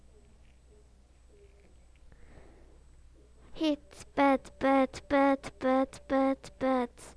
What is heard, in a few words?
beat